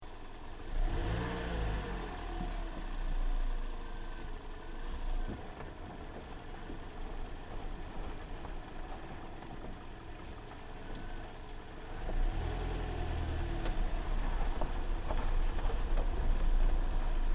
Car Toyota Corolla maneauvering engine bay gravel, hatchback; 2009 Toyota Corolla hatchback; Cars